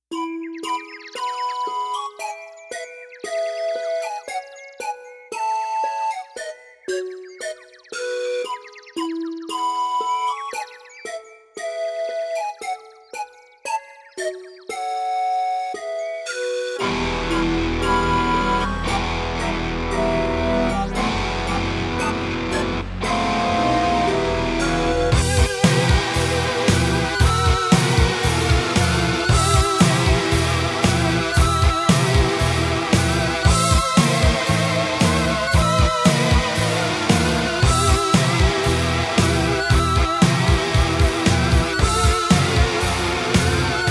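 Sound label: Roland SC-8850; Doom Revisited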